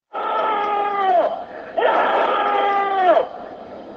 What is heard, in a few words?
nooooooo